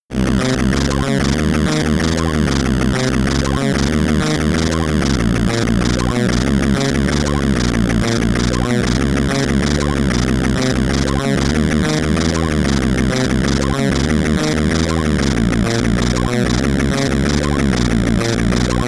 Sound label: SID Stereo